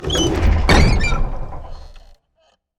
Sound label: hermit door open